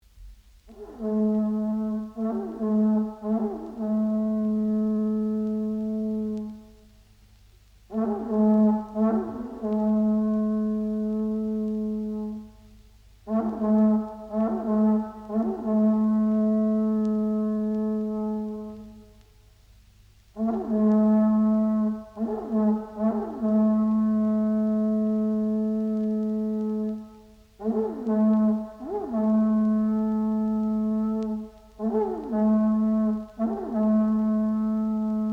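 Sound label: japan trumpet shell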